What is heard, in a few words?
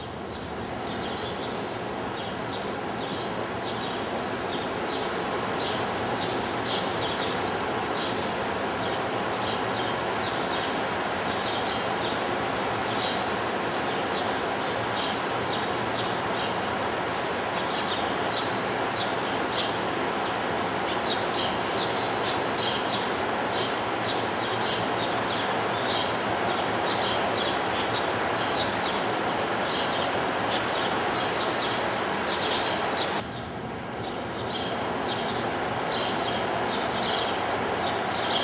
grackle